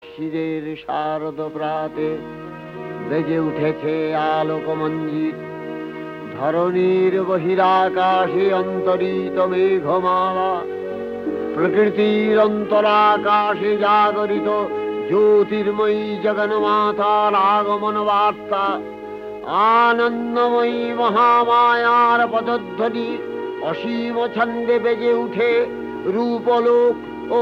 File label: mahisashur mardini chant; birendrakrishna bhadra; durgapuja